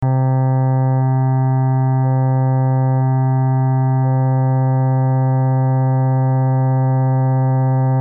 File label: cello